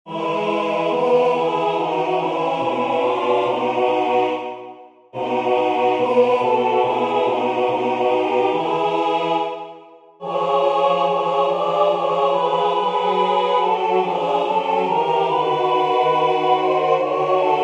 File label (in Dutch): Tutti, koor; W.A.Mozart; Ecco quel fiero istante